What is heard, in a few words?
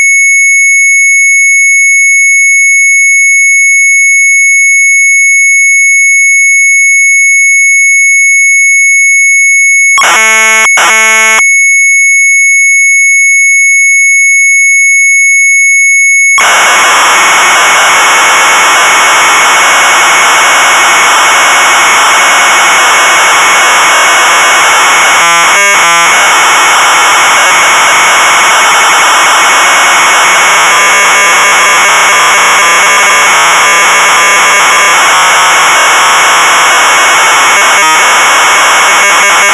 Sharp MZ800 Tape; SHARP SHUFFLE; retromelsarchive